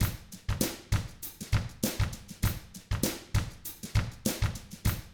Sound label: FUNKY, Drums